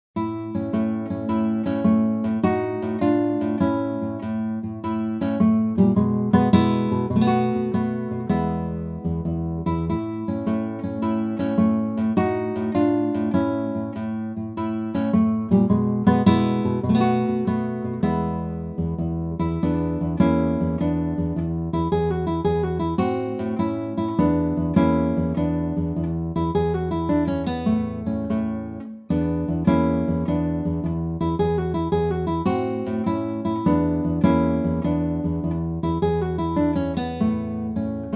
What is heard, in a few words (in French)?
guitare etude allegretto